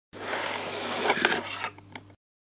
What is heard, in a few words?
wooden box